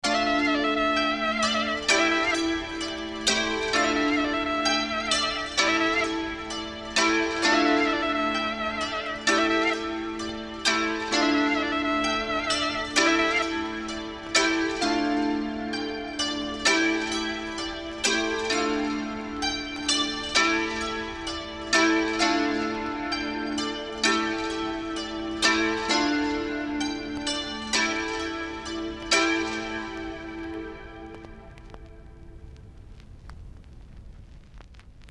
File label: 130 bpm